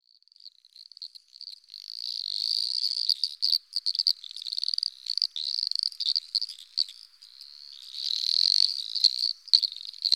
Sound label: eastern dwarf frog